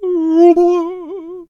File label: Healer Attack Vocals